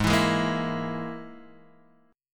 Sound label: G sharp Suspended 2nd Sharp 5th, Guitar Half Step Down